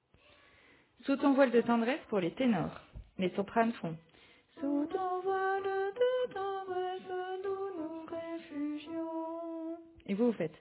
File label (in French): Tenor